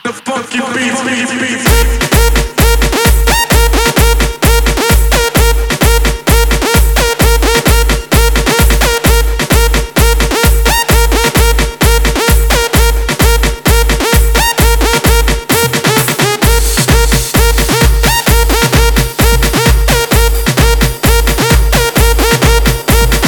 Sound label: funky beats